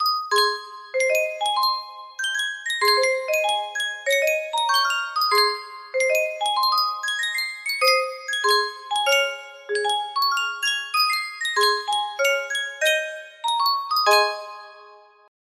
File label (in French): sankyo boite a musique